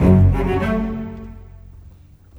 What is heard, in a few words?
Bass Cello